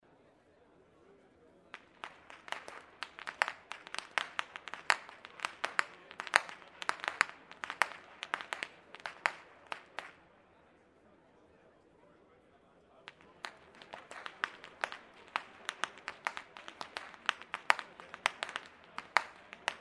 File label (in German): schnalzen, jugend; waging